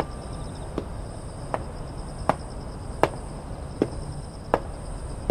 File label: Footsteps